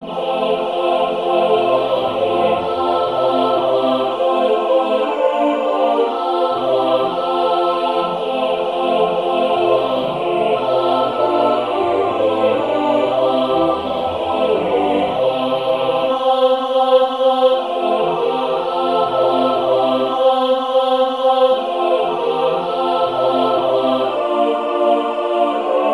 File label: midi